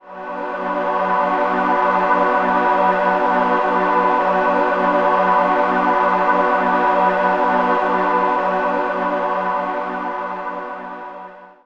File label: Mist choir B, Ambient Pads; Zero-G Seismic Frequencies; 90 s Sample CDs